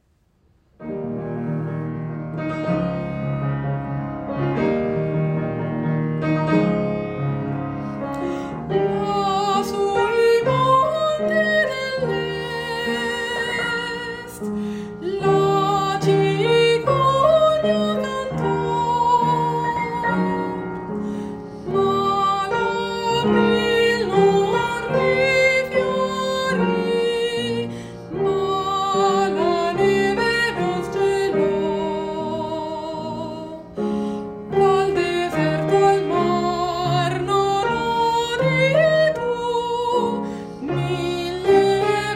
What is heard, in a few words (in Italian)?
piano e voce; Puccini Turandot